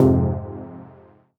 Fm Dub Chord; CHORDS; Electronisounds; Enigma Dub Cube One Shots

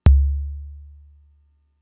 Kick, Drum Machines Fricke MFB-522

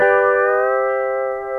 PEDAL STEEL

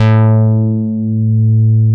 A2, MOOG1 BASS

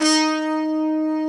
TRUMPET D#3; Greytsounds VOL-1

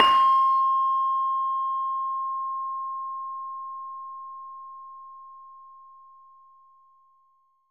LAMEL C5, Asian Traditions; USB Soundscan vol.60; AKAI; LAMELOFO

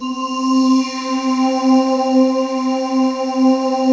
SEA BELLS

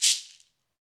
Ahfuche; Club-50 - Foundations Roland; Ahfuches

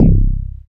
BAS FM DX-7

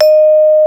VIBRA C5

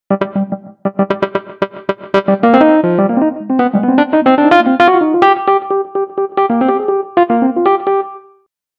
Rhodes Solo, Cinematic Funk; Sony Creative Software; Urban Tone Poems; Some Water

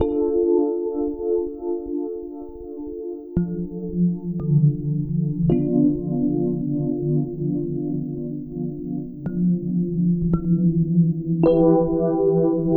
FM Chords, Keys

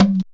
JUNKU HITS; Best Service; World Colours

